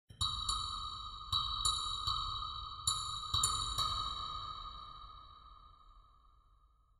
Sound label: ambient synth grain click